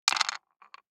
DICE on Wooden Tray, Throw and Roll, Standard, 4 Four Dice; Samples; ArticulatedSounds; DICE Sfx Party (Extended)